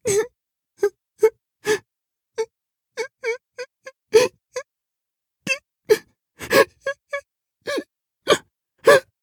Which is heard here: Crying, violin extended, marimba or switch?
Crying